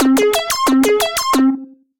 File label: Rubber, synth rhythmic; Rubber Technology; Ableton; Factory Packs; Punch and Tilt